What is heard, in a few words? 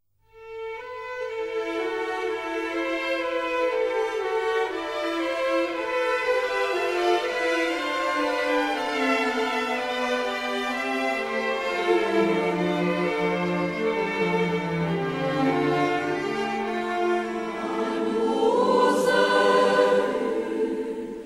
Missa IV for choir and orchestra